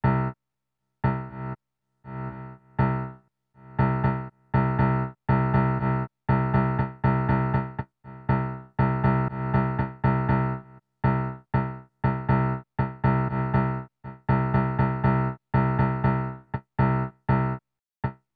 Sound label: random duration envelope AD